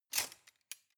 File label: cutlery large multiple drawer grab